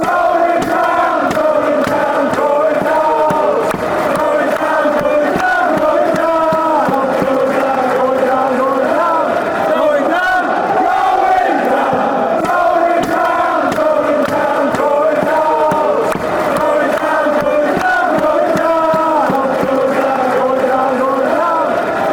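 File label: going down, football chant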